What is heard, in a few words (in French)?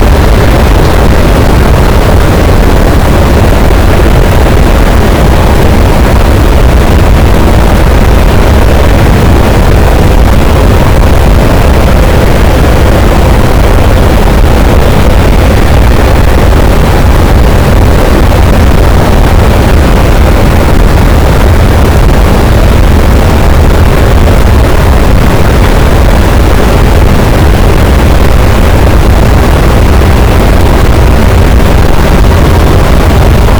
cachalot, boat; Physeter Macrocephalus